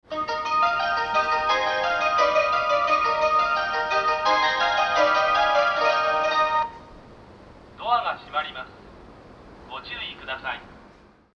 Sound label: melody